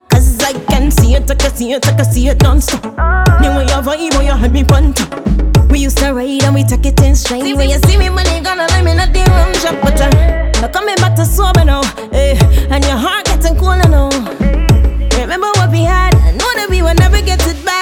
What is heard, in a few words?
reggae after